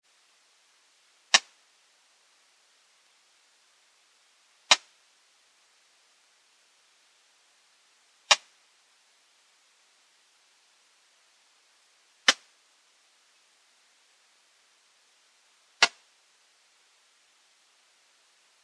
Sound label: speckled bush cricket